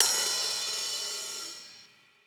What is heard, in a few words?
open hh; eddyflux